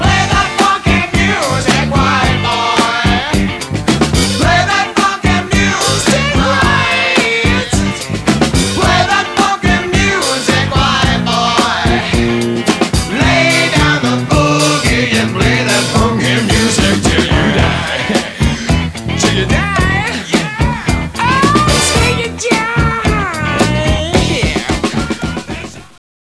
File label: music fade